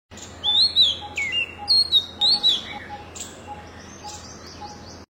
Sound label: Oriental magpie robin